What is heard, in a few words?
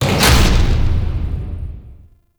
Door Close; Narmer Outpost End